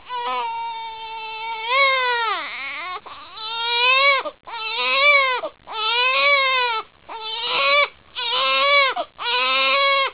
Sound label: baby